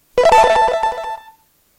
coin; street fighter

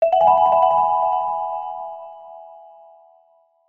ALERT

